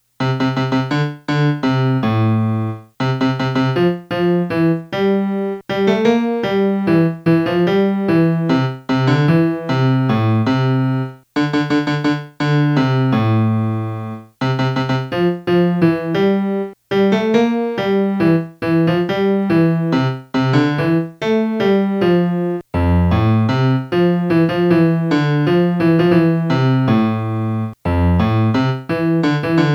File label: melody